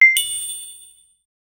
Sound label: short pleasant item picku, blip